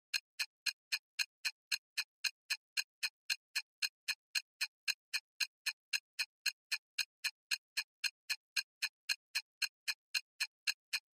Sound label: Ticking Timer Type 2 Fifteen Seconds